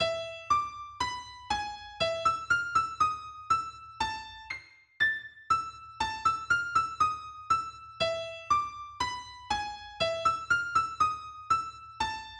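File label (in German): La Cumparsita Traditional Solo E-Gitarre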